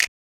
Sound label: Snap